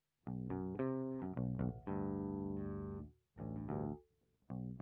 Bass DI

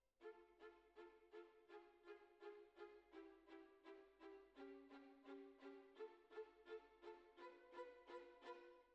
Oboe

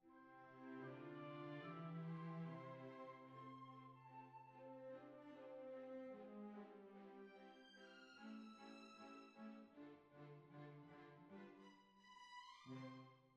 Cbasses

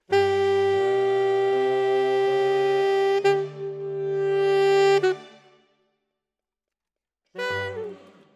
Alto, RNR for piano

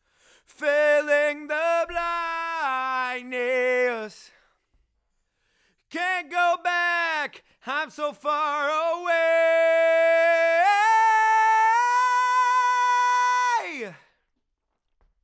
Ad Lib

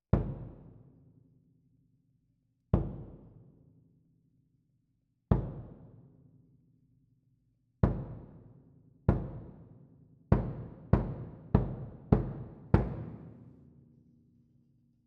Cassa Close

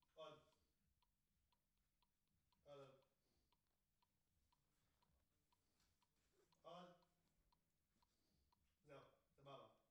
TB GTR

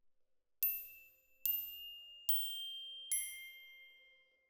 Glock Close, Jazz for piano

